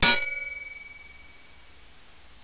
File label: bell